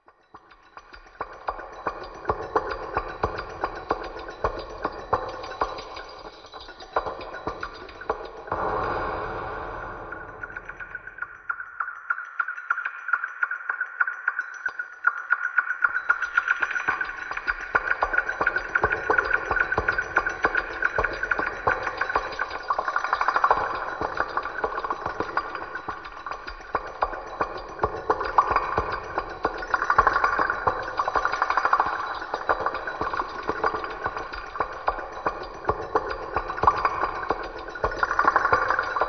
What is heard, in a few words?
Pipistrelles; colombia; Pipistrelles 2; Metal bats